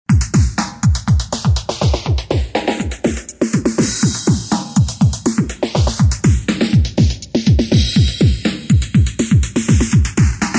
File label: drum